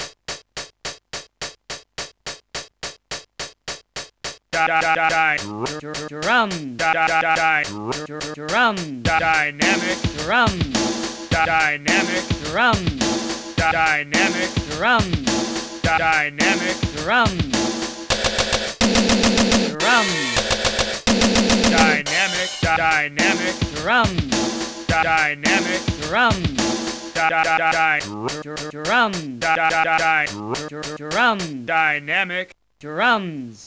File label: Dynamic Drums Song; Oakvalley